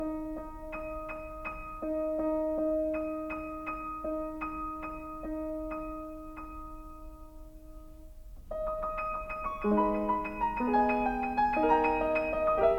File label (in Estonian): Etüüd; LÄÄNE EUROOPA 19.SAJ. MUUSIKA; Liszt, F; La Campanella